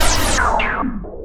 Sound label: ZAP AWAY; AKAI Anime Sound FX; SCI FI